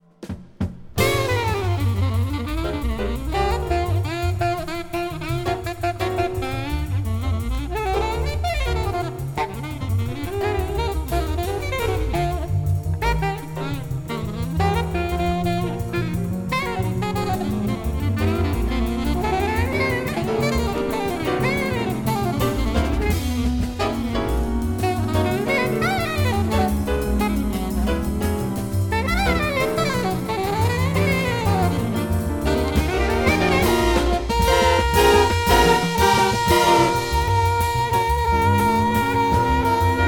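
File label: alto, saxophone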